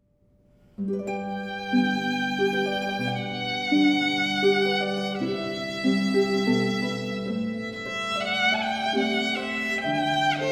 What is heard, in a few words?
violin and harp duet